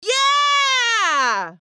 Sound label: Female yeah reaction